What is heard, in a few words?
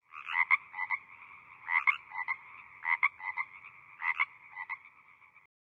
Frog, ambience